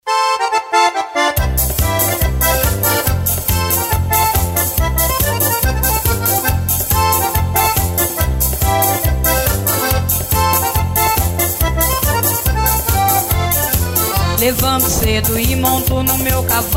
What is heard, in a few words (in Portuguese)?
peão de boiadeiro